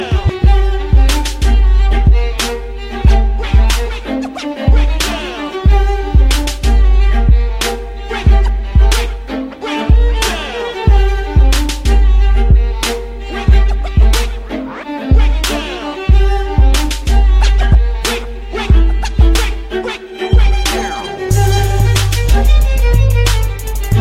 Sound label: dirty beats